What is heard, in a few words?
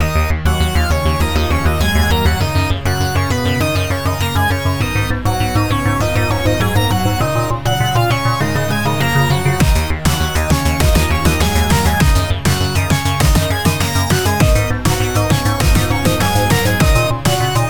racing tecno slow